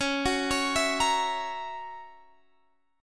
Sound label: F sharp 7th, guitar